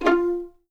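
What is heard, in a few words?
F4 pizzicato forte right, violins; East West; The Ultimate String Collection; VLNS PIZZ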